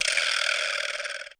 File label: Fat Frog; Alesis D4; Efx